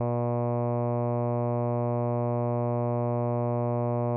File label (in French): A, un "a" d'une voix de basse, sans vibrato; données expérimentales sur les formants d'un "a" d'une voix de basse; sans modification des paramètres; AteliersHenrich